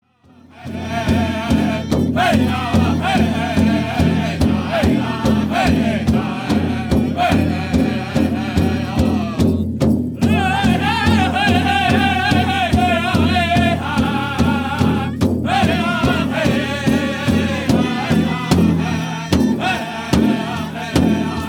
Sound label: Grass Dance